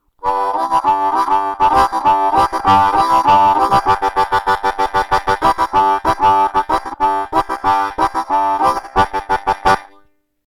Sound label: Chugging Rhythms